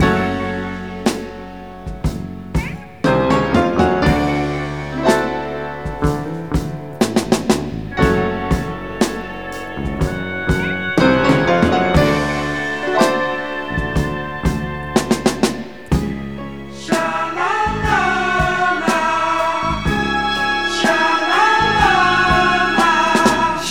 full, Loops Phonky

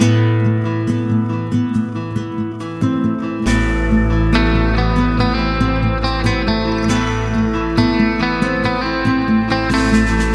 guitar, Sad

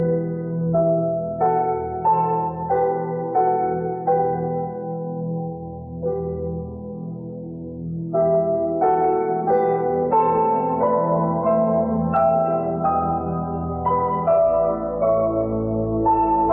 piano and keys, Loops; Phonky

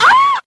scream

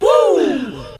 "woo", male

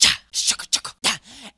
shiggua shugga, Beatbox

